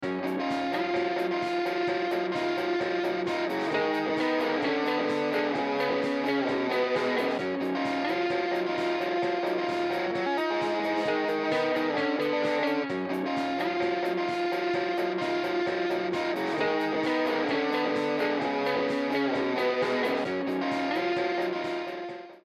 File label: Guitars